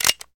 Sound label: quick Stop; wild wild west